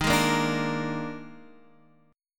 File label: D sharp Major 7th Suspended 4th, D sharp, down, Guitar, Half Step Down